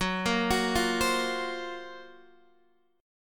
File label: F sharp Major 7th Flat 5th, Guitar, DADGAD